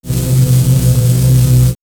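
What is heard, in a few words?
Fully Loaded, electronic fx; X-Static Goldmine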